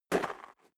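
step dress boots gravel light single right, footsteps; schritte; menschen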